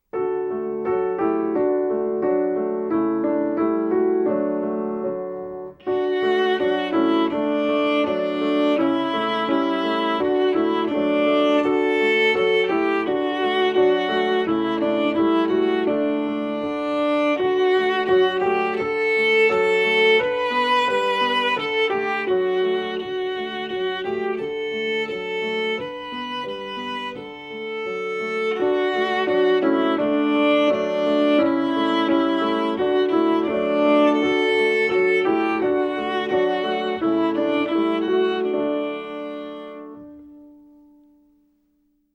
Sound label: aunt rhody lullaby violin